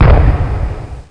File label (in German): feuerwerk bang; funrun